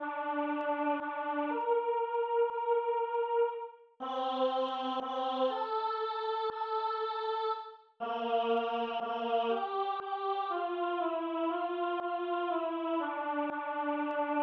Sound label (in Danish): kanon Alle stemm; Dronning Dagmar